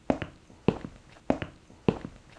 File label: footsteps